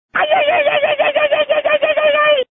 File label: Ayayayayayayayay!